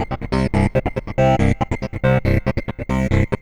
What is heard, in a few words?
Trance Bassloop 140bpm, Bass Arps